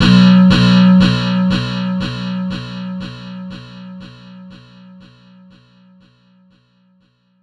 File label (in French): Guitare echo